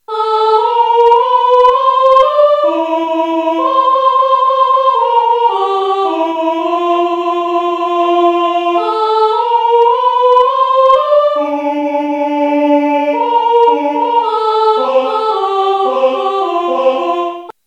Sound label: fanfare soprani; (midi); Fanfare du printemps 2